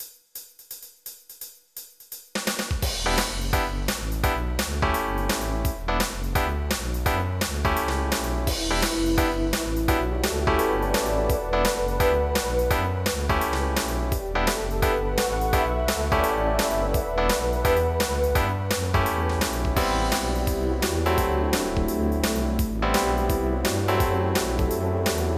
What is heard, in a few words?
BLUESY